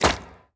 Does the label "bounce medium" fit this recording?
Yes